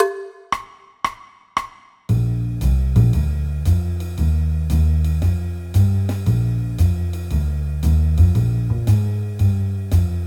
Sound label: piano backing track